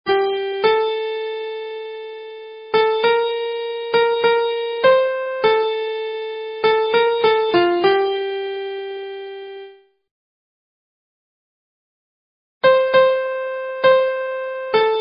Midi

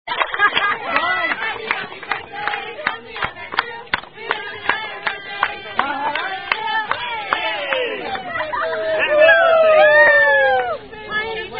Happy Happy Birthday; Outback

